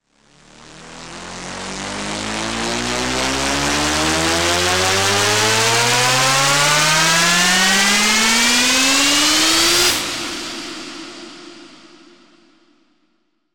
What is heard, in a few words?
Riser